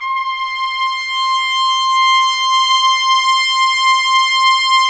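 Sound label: Brass Orch Ensemble; Roland L-CD702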